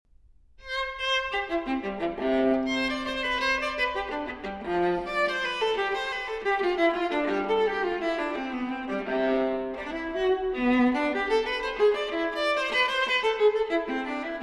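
Cello Suite No. 3 in C Major Transcription For Viola Courante; J.S. Bach; BWV 1009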